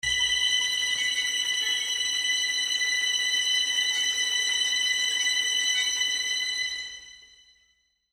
dangerous break

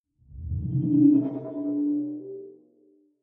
sci-fi display transmission damped

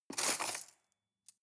cutlery cake forks drawer grab hard

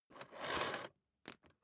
vacuum cable drag out single

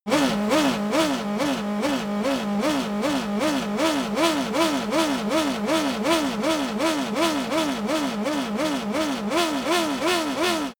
racing car f1 engine rev sequence

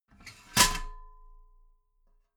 metal ladder small handle fold heavy

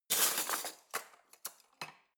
cutlery spoons drawer place hard

